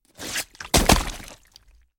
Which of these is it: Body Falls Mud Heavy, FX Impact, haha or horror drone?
Body Falls Mud Heavy